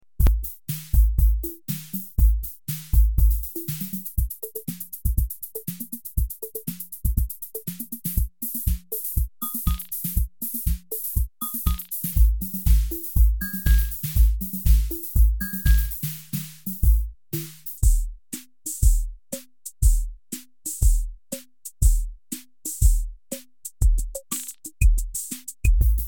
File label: rhythm